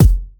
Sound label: Kick, Kickdrum; Equipped Music; Loungin House